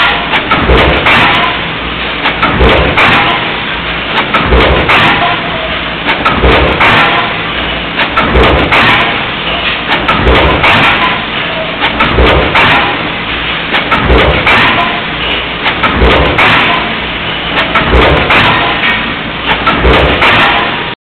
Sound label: ambient